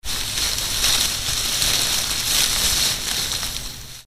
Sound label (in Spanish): Murmullo Hojas; Banco de Sonidos - Facultad de Art